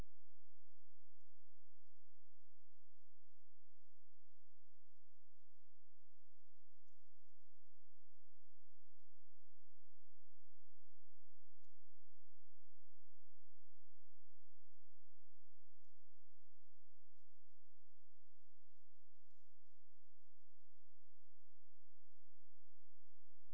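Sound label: hydrophone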